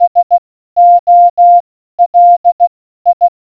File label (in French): code morse